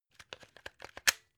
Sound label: plug unplug clatter